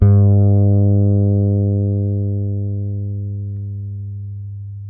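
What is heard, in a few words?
A 3, French fretless bass; Spectrasonics; Bass Legends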